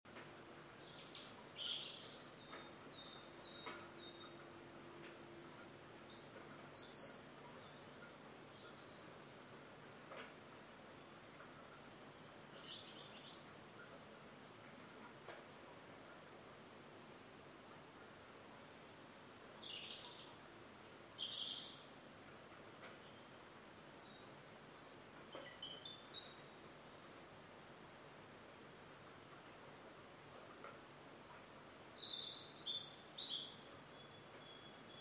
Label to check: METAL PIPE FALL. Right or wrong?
Wrong